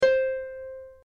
harp, Harp; Real Samples

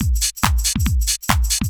TECHNO BEATS 140

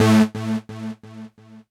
KEY SHOT TEKNO 140 A; Techno2; Singleshots

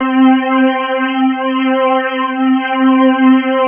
pad bounce

